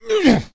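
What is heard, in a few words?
moan, male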